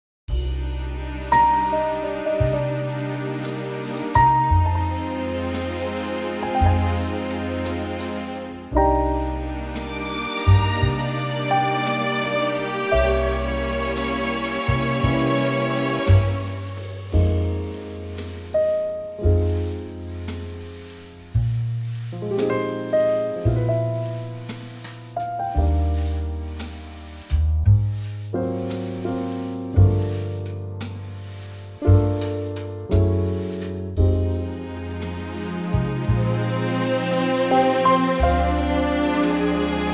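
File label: Eb, backing track; key - Eb - vocal range - Bb to F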